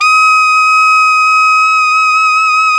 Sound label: sax alto mf; Alto 414